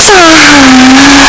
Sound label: pikachu cry; pokeyellow